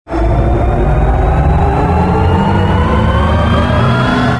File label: overload; movers objects; artus gen